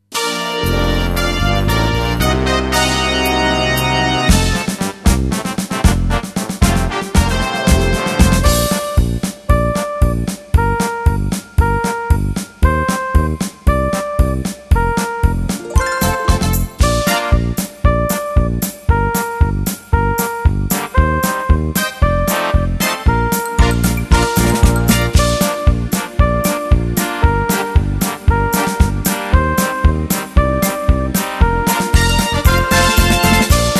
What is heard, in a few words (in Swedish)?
Långsam, trombon trumpet